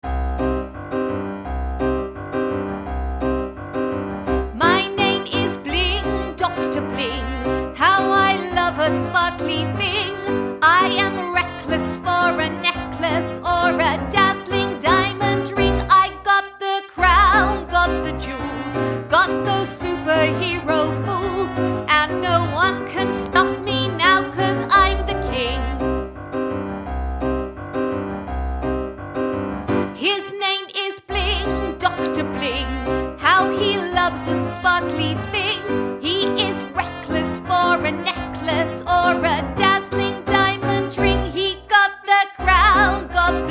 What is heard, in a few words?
vox